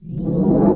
SHIELD ON

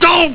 doh; Viper

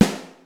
LIVE SN; BEAT2000

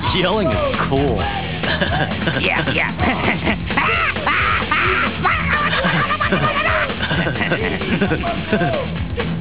yell

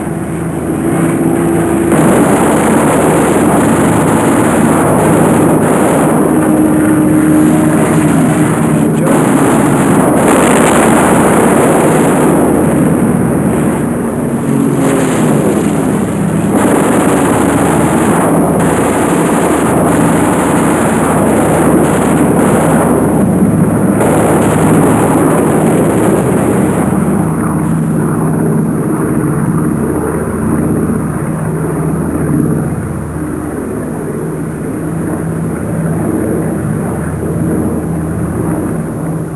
battle; fx; warbirds

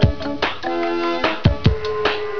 SHOCK

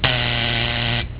BUZZER, DOORS; EFFECTS

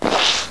SQRUNCH

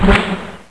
SPIT; THEXDER

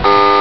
buzz; mastermind

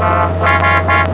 HONKS

